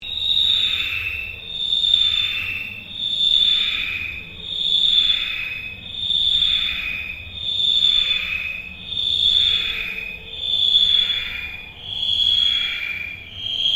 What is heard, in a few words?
Ambient Drone Slow Sweep; Sound effects created by manipulating other sounds; Drones (Sound Effects:Sound Design)